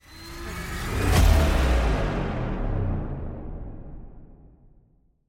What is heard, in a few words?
Bass Drop; SoundsCrate